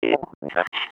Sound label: rock; space kitty